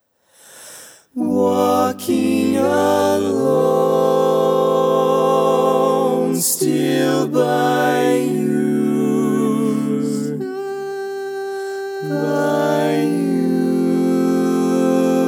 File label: Lead, barbershop